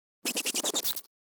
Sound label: Insect Squeal - Faster; Hollywood Edge; sound designer's toolkit v2